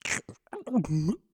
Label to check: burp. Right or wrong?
Right